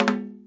daibyoshi B3, Japanese Drums; ETHNIC and WORLD PERCUSSION

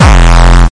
even more insane kickdrum; Kicks; single hits

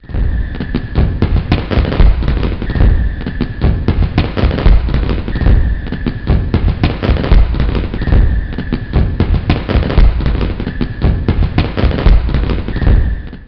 pull out cables; Various Strange Sounds and Effects

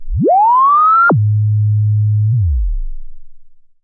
noise, Noise Sweeps

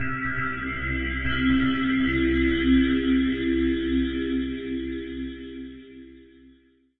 Digi Mass C2, Synth

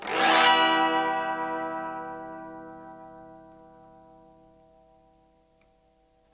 autoharp E min, Multisampled Autoharp Chords

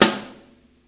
rubber snare drum; single hits

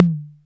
Korg KPR-77; DRUM MACHINES

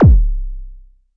mbrute kk, Arturia MiniBrute; DRUM MACHINES

